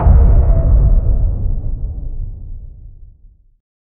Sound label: void magic impact dark ene